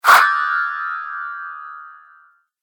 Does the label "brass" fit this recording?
No